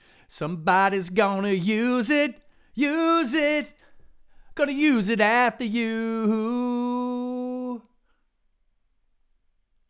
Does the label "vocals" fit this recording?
Yes